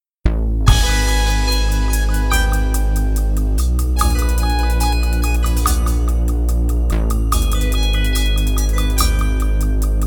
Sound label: Desert Rain